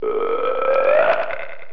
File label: comic